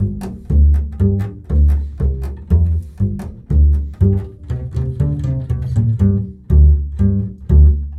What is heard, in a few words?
Bull Fiddle, Appalachian